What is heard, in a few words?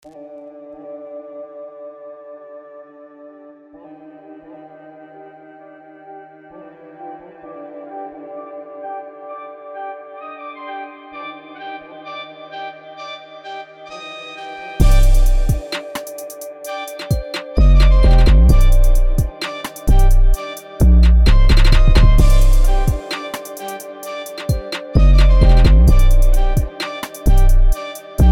trap, beats